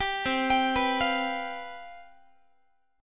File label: C Major 7th Suspended 4th, down, Guitar; CM7sus4 arpeggiated